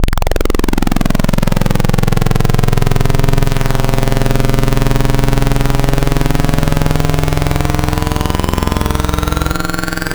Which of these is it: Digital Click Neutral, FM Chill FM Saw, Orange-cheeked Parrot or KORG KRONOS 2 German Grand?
FM Chill FM Saw